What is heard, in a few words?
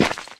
ledge land; Golden Crayon Sounds